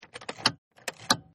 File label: Car Key Ignition Pullout-2 Takes; Cars; Vehicles